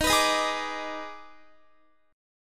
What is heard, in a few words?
Eb Major 7th Suspended 4th Sharp 5th Eb, Guitar; EbM7sus4#5; strummed